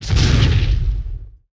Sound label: Impact; portal quest android; Paladin Skill1